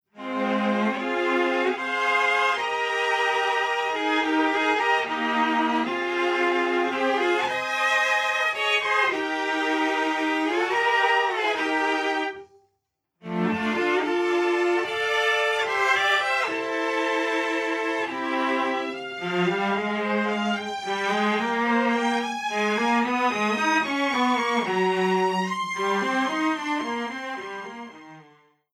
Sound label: Strings Va SSL F6 On